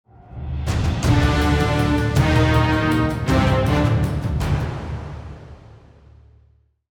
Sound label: Stinger